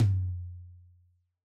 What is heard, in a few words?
Yamaha DJX 2B, Jazz Tom